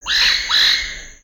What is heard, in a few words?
Bird Amazon, ambience avant gardens; Lego